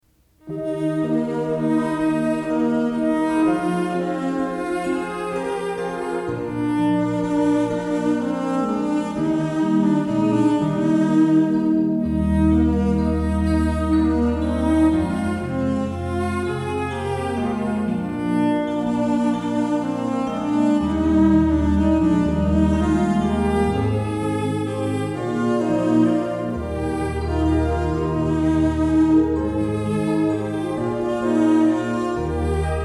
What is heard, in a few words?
Keys and Violin, Jewish Wedding; Wedding Ceremony Music; Ma Tovu